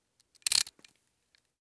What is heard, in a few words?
synchronizer 35 mm footage counter being reset